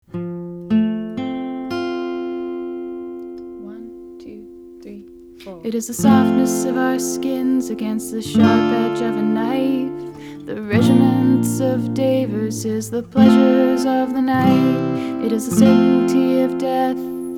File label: verse harmonies low